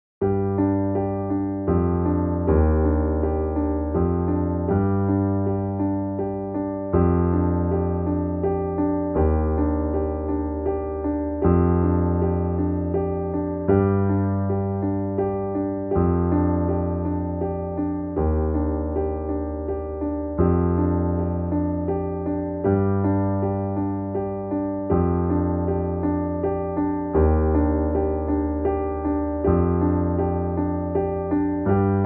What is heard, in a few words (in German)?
Sonnenuntergang Klavier